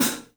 3D Drums, TIGHT ZILDJ; 3D Sound

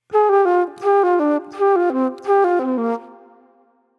Flute Noir

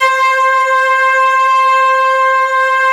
ST-ORG, STRINGORGAN, Yamaha VP-1

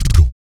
VOC PERC, Vocal Planet Jazz FX BASS PERC

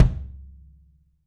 KIDM, 3D Drums, MAL KICK

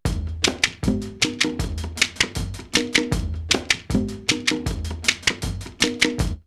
Addis Abeba 78, drumloops World & Ethnic; X-Static Goldmine

